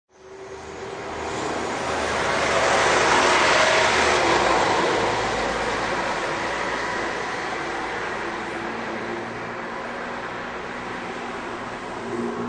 Town Road Traffic Hill, Town intersection traffic: Town ambience near traffic lights; Town intersection traffic: Town ambience near traffic lights. Cars and buses stopping and driving. Occasional distant; Traffic And Car Sound Effects; Lynne Music